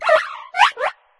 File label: cry; pawmo